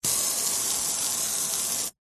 vehicles car windshield spray water jet from hose short ext